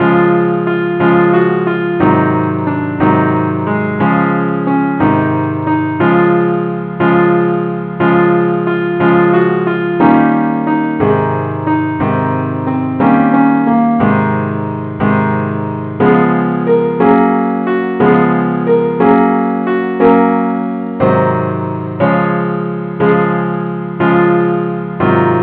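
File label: simple accompaniment keyboard; key des; angel voices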